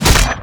attack; spirit breaker

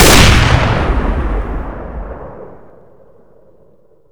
sniper assassinate; weapons

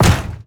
metal heavy drop, inventory; ui

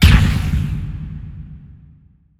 dispose stun, weapons; dota; marci

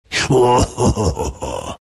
laugh; Venomancer

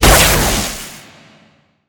plasma field impact; weapons; dota; razor

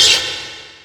wisp damage; weapons; dota; dark willow

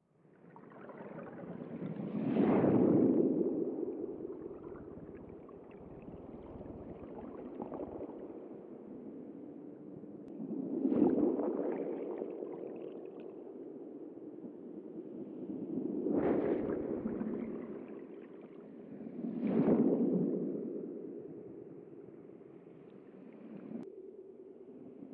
reef night; ambient soundscapes; dota

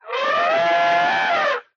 elephant

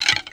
skulls, footsteps; witchdoctor

